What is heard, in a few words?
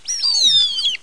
MOUSE; Bible Fun Pack; Education Publishing Concepts; NOAH